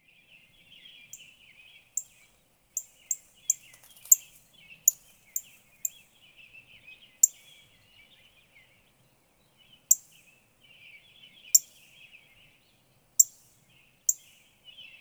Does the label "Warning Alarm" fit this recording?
No